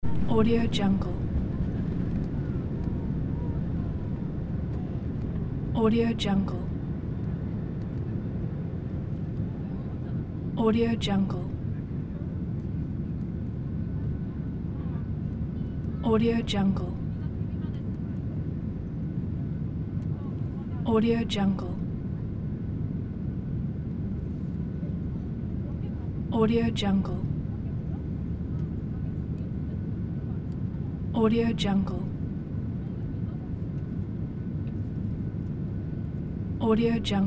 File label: airplane ambience